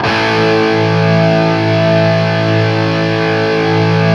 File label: 5TH A looped, Guitar; East West; Steve Stevens Guitar Samples Collection